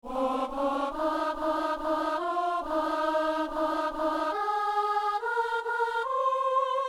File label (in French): psaume, soprano; Saint-Denis; Psaume 95 (96); Messe du 17 janvier à Saint-Denis